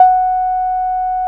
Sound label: SIEL, String Machines